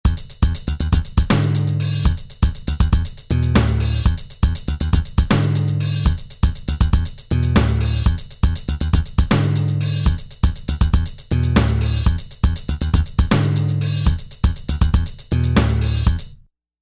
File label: Funk